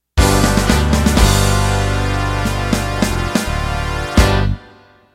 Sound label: Motown End